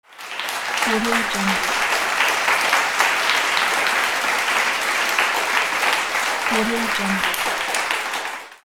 clapping

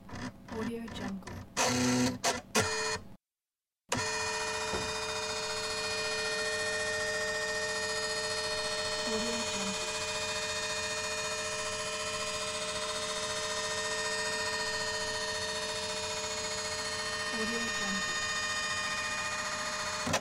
scanner

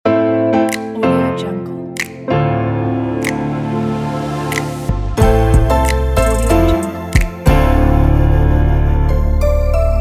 modern and elegant logo

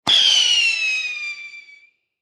single bottle rocket whistle explosion

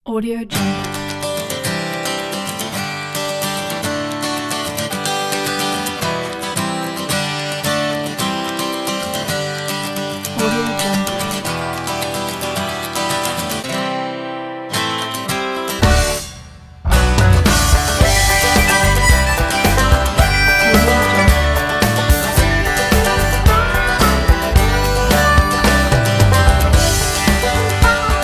acoustic folk

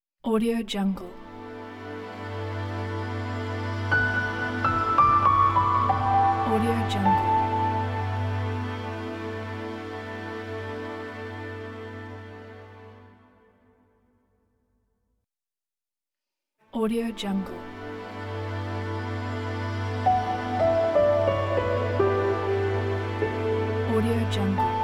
minimal strings piano logo; angelomarrone

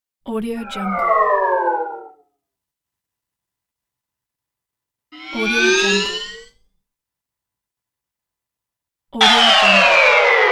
reverse fxs

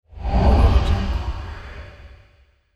tunnel whoosh; ldj audio

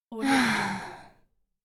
satisfied teenage girl

